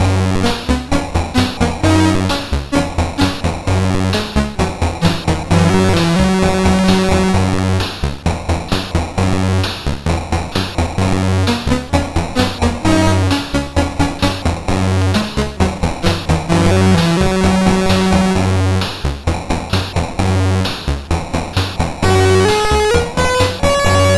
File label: Synth Sample III, CSG8580R5